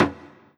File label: Roland SC-88 Mute Surdo